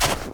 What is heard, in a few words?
snow place; everness